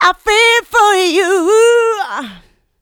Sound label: mixed vocals; Houseworx